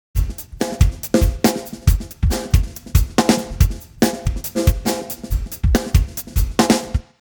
drums; timestretch

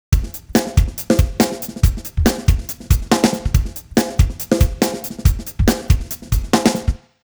drums, timestretch; usb uvi workstation

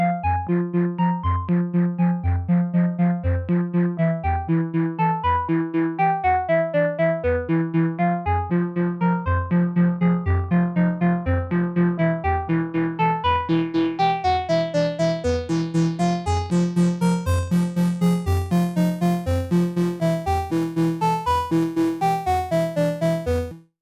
mopho seq quiet; davesmith mopho

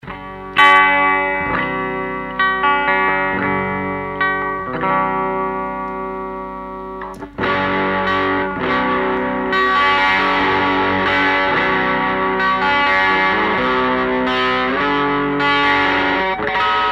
Crunch tone pop sg vox ac30; line6; tonecore; Uber-Metal

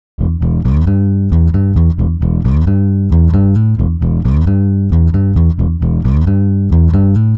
bass, timestretch; prosoniq time factory clear scale

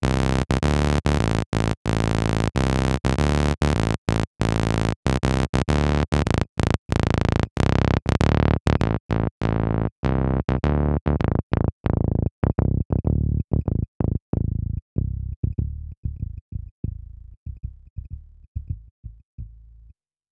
mopho bass fat bleed; davesmith mopho

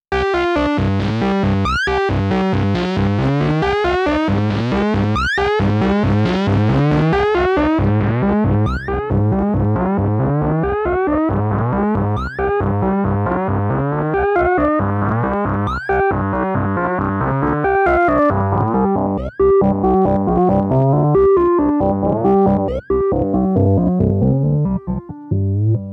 mopho seq destroy sync; davesmith mopho

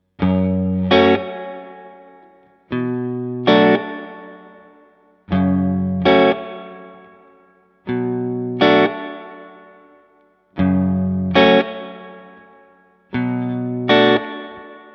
bogner reverb spring, combo guitare; 112 combo; bogner alchemist

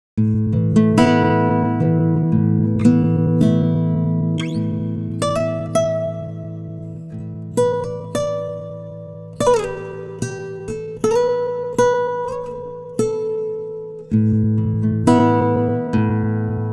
guitar, timestretch; i3 dsp quattro x

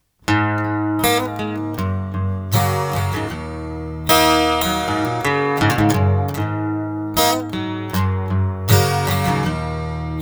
chorus detune; tc electronic g natural

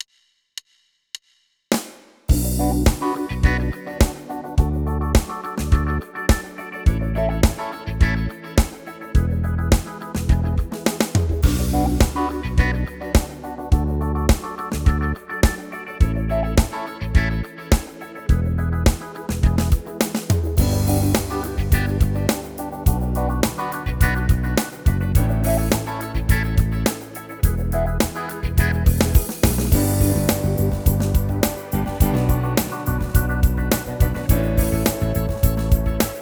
style pop and rock contemp pop, yamaha tyros3

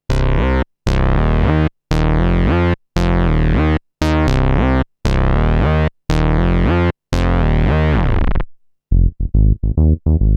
synth bass; kurzweil pc361

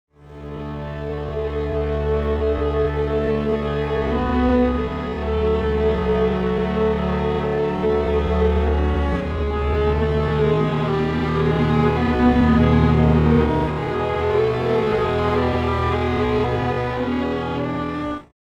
strings

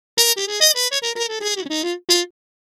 harmon mute; samplemodeling

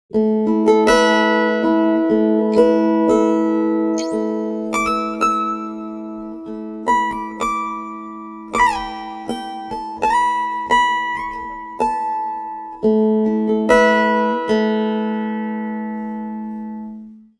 pitchshift guitar; bias peak

